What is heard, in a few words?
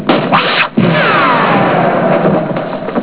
laser rifle